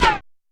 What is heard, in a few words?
U220 FX Set, Drum Machines; Roland U220